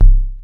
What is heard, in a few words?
Bass Drum, Cwejman S1 MK2, Drum Machines; 300 Drum Machines